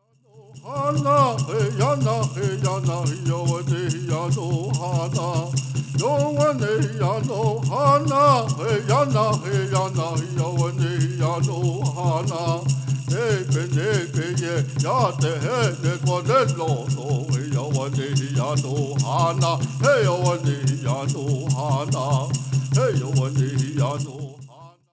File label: Peyote Song